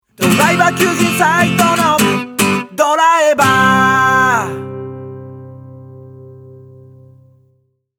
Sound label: soundlogo